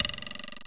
TWANG

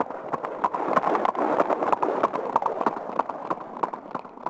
hooves; DAoC

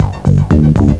bar, Music; Swoop 1.0.2

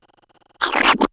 squish

